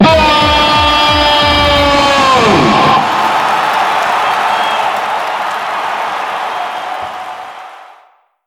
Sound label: Win; genesis; euro Golden Cup Desktop